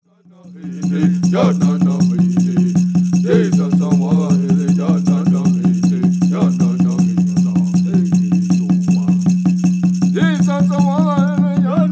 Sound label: Ceremonial Song